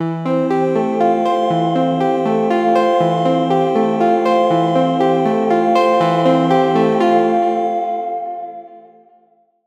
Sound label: PS-3300 Harp; Cherry Audio